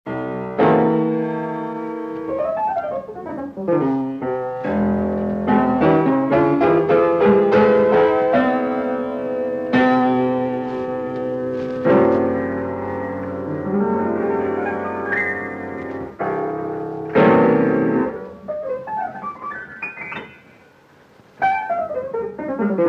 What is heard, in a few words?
Improvisation